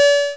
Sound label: flip